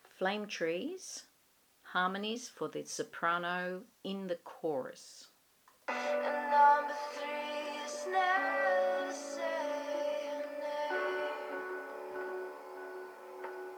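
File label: Soprano Chorus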